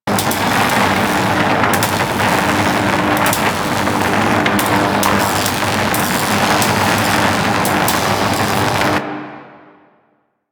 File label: Elemental Breath Electricity Loop; Warframe; Dragon